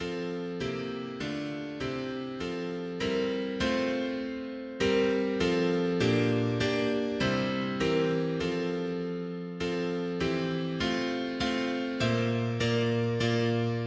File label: mono piano; hymns; Himnario grande